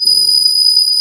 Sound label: CMI ORGAN; Wizoo - Powered DX